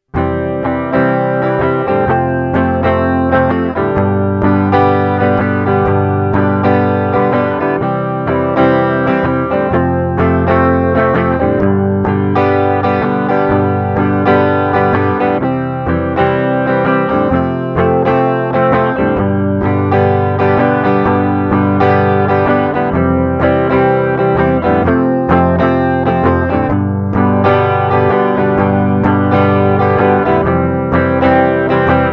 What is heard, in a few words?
backing track in C major